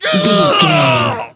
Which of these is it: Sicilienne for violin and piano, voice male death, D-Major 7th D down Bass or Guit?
voice male death